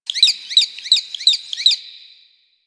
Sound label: Valley Tree Critter; Ambience; Warframe; Quests; The New War